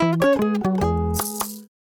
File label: Jingle; Macif